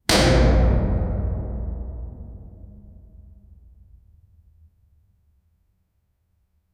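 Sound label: pounding a heavy iron door; cavern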